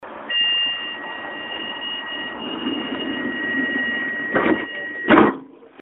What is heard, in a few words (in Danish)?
oresundstog, toglyde